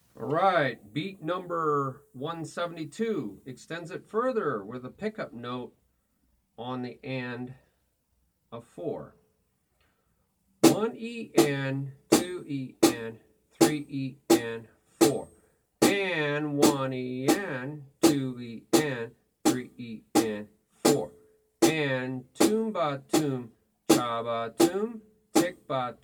Beat Toom Ba Toom Cha Ba Toom Ti Ba Toom Cha Ti

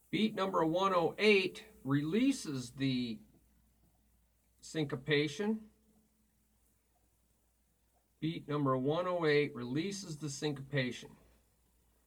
Beat Toom Ba Ba Cha Ba Ba Ti Cha

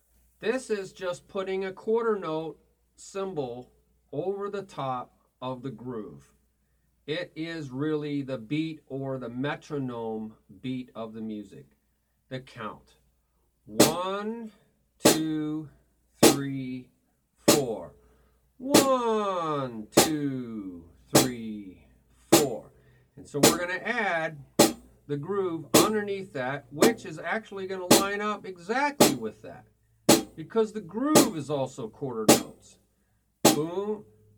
Time 1 2 3 4 Toom Cha Toom Cha

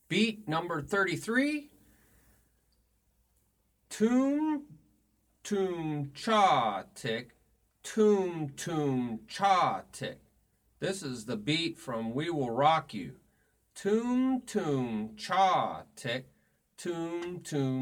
Beat 1 2 3 4 Toom Toom Cha Ti Toom Toom Cha Ti

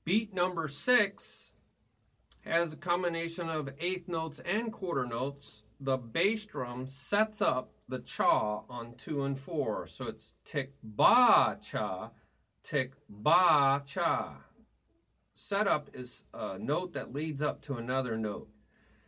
Beat Ti Ba Cha Ti Ba Cha

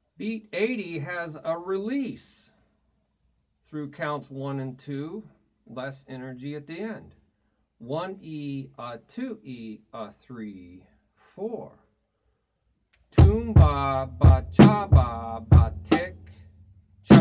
Beat 80 1e a2e a3 4 Toom Ba Ba Cha Ba Ba Ti Cha

